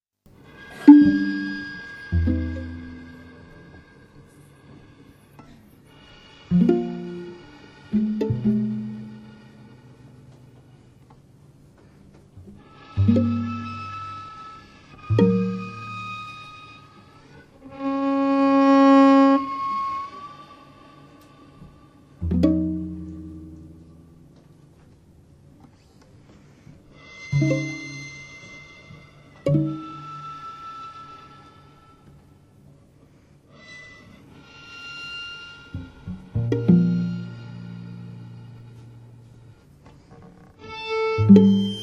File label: String Quartet